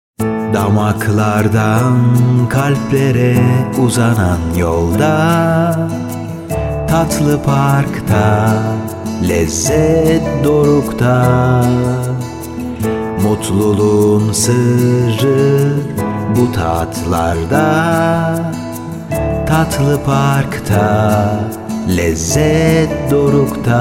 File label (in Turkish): Jingle Vokal; Tatlipark